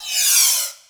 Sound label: ReCrash; FLPRO